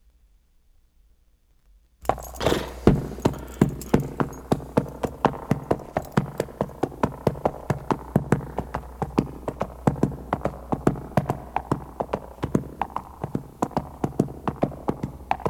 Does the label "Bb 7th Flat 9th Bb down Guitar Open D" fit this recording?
No